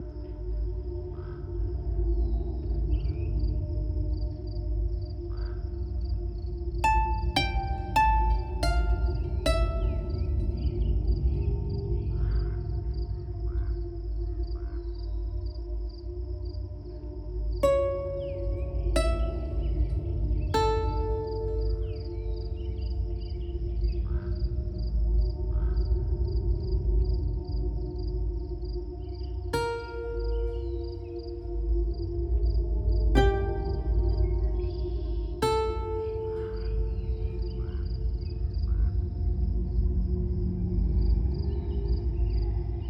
Forest

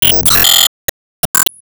Enemy Temple Active Hit

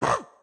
Triple Swipe Attack; Going Under; Caffiend Caelan

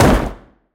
Crash

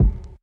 Mech Footstep Stomp; Styx; Going Under

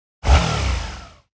Board Upgrade; Subway Surfers City; Android, iOS

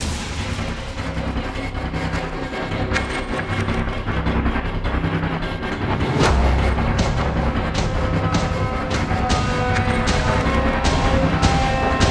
Base Loop low

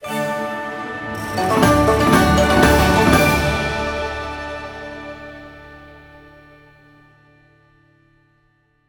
Chest Opened Jackpot; LEGO Horizon Adventures

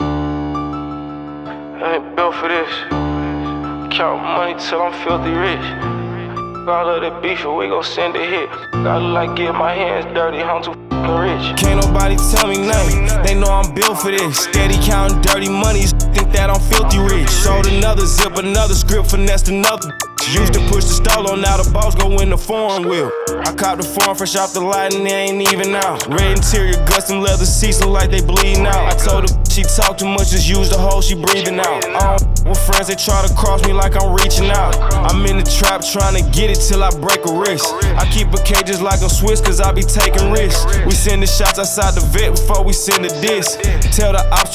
HIPHOP; XTREM; test drive unlimited solar crown; xbox series xs

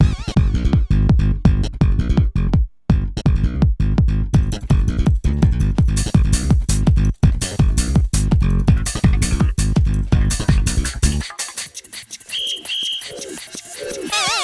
Kart Racing Construction Site Intro Loop

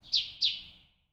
Bird

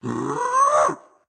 Dash Wind Up; Caffiend Caelan

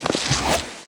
Sliding; Disney Star Smash; Android, iOS, Mobile

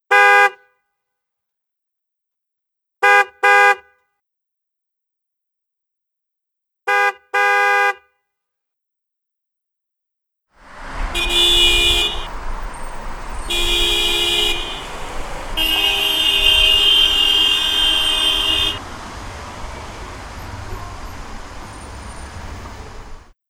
Golden gate bridge Car horn; Google Earth VR

